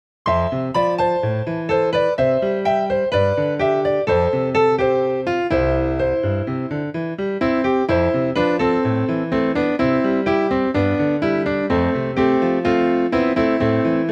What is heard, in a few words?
Accompaniment